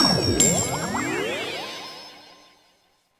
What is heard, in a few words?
race finish line; Garry's Mod; racing tool